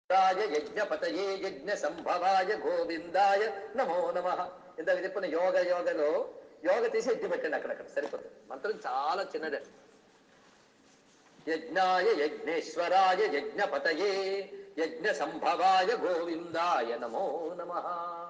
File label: Krishna mantram